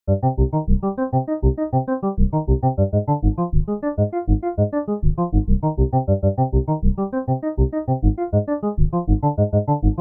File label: Syn Bass